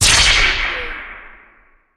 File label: Magic Wand Blast